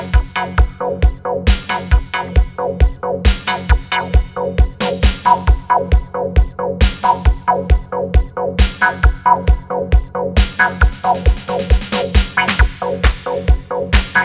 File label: deep string